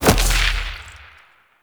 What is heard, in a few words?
Edge Kick; Warframe; Pacifist